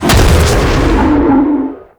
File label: Weapon Fire; Enemies; Abilities; Ethereal; Warframe; Zariman; Void Angel